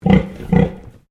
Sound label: Animal Pig Landrace Inside Grunt Double; Edgar; Edgar's Mission Farm Victoria; Pigs; Animals